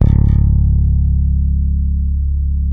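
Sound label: Sadowsky 5 bass, C#1; Sampleheads; Will Lee Bass Library; SADWSKY5 SUS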